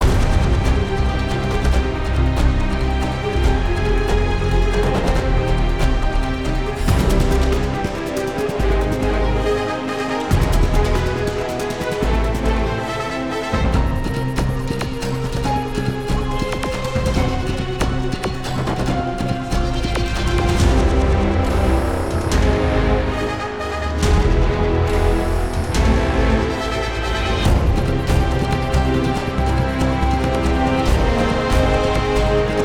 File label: Walking